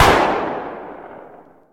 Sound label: shotgun distant; Project Zomboid v41.73